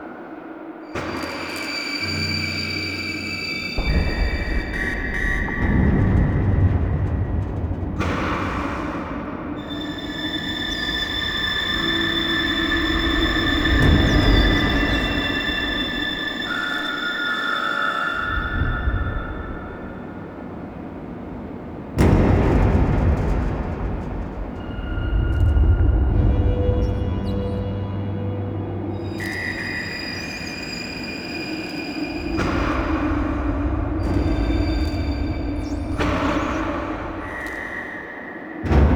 Interior Bed Loop, Ambience; Nineteen Ninety Nine

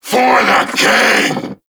Vocal Attack; Warframe; Duviri; Dax

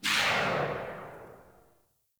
Fire Tail, Weapons; Warframe; Burston

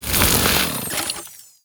Kusarigama Extinguish, Weapons; Warframe; Grineer

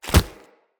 Grunt Foley Kick Impact; Weapons; Enemy

